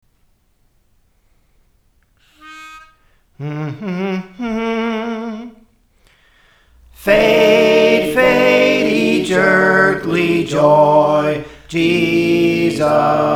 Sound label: Soprano; Praise For The Lord Training; Jesus Is Mine